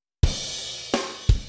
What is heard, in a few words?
drums; musdb18